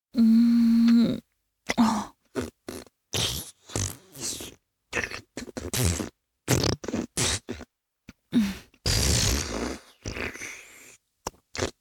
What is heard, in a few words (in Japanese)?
フェラチオ(弱):頭を前後にゆっくり往復して陰茎をしゃぶる, チュパ音; チュパ音抜粋集